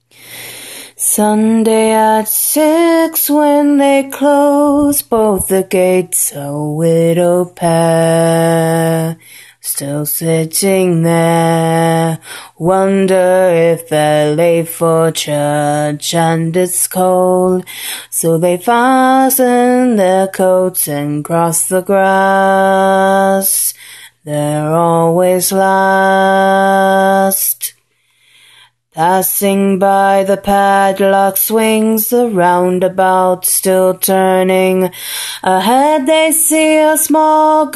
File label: Acappella, Low notes galore, Short and sweet